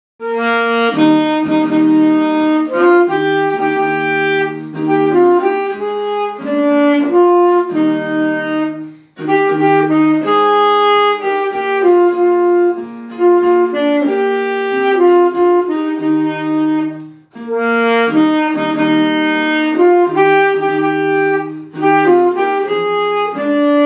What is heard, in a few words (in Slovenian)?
saksofon; Bozicno drevo